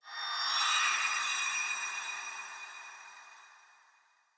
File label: UI Lore Pip; Hud UI